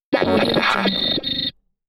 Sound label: scifi radio harsh tuning